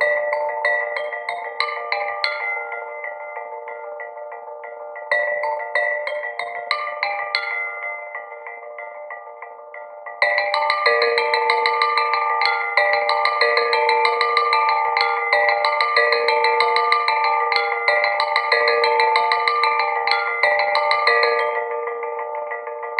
jangly stem loop

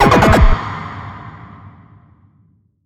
LEVEL CLEAR; high hell

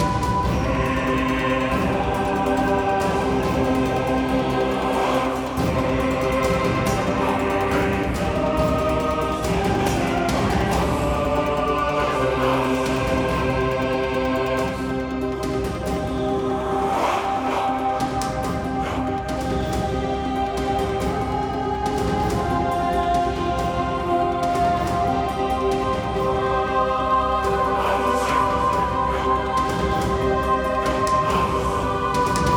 Enemy Turn

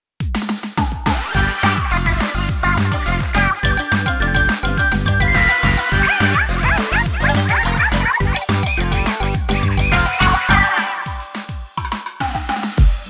Victory; Team Shiver, Phase 2

